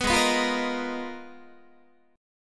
Ab Minor 6th Add 9th, Ab, Guitar; strummed; JGuitar

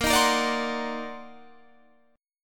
Eb Minor Major 13th Bb

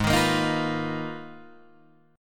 G sharp Minor 6th Add 9th, Guitar DADGAD

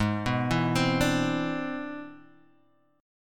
C Augmented Major 9th Ab

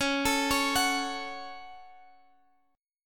C sharp Suspended 4th Sharp 5th C sharp, chord; C#sus4#5; arpeggiated; x,x,11,14,14,14

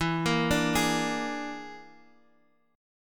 E 7th Flat 5th, Guitar, Drop D; chord shape

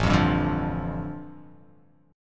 A 7th Suspended 4th Sharp 5th, A, down

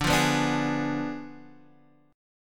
D Diminished 7th D, Guitar, Half Step Down

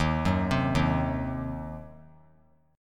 Eb Minor 6th chord; arpeggiated

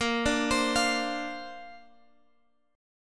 Bb Major Add 9th, Bb, down, Guitar, Open G

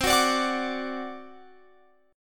C Suspended 2nd Flat 5th C down Guitar Open F; Csus2b5 strummed

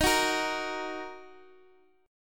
Eb Minor Eb 4G 5C 5E 5A; Ebm strummed; JGuitar

